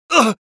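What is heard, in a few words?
pain sharp, Spanish; Scout; Voice file from Team Fortress 2 Spanish version; Scout audio responses/es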